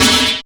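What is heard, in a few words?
snare, Acidic Kit, Percussion, Dance; Dance Synths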